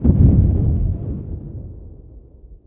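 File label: explosion muffled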